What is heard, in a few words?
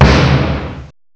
SNARE, DRUMS